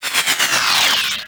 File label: Survivors Upload Power Down; Encounters